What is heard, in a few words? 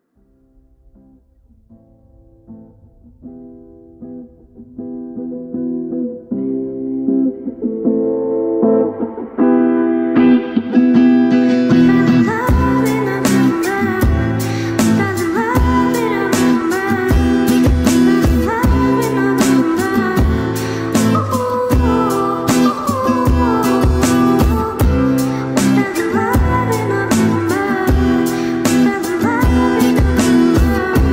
speed up reverb eq